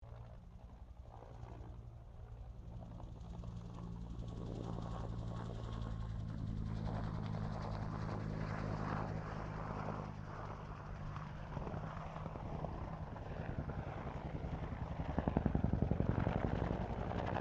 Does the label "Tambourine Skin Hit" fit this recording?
No